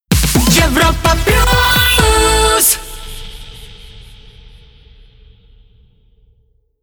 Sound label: Jingle Rapid Fire; Europa Plus